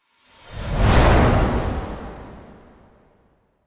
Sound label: Countdown Whoosh; UI; Undercroft; Warframe; Duviri